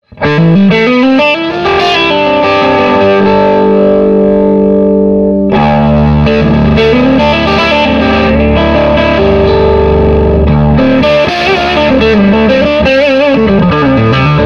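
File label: SG crunch, Gibson Historic SG; Swart AST Pro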